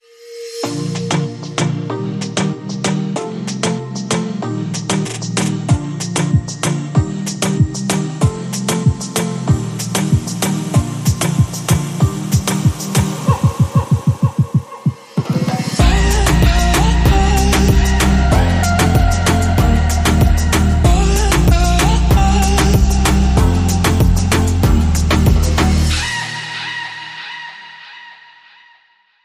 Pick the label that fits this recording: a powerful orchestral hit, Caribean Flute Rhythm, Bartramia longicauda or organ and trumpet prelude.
Caribean Flute Rhythm